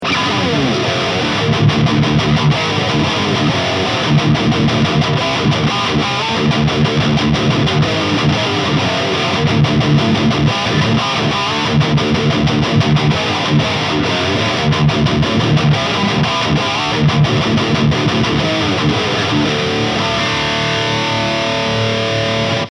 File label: ESP LTD KH V High Gain; Metallic Gold